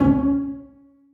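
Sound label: basses pizzicato c4; Sonatina Symphonic Orchestra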